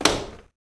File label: button